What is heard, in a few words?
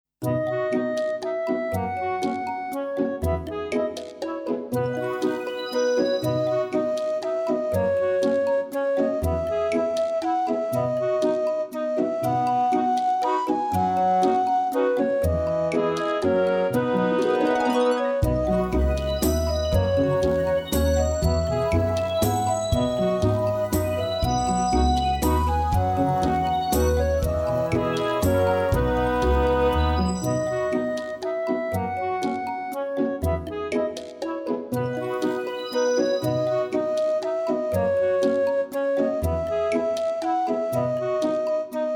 Melody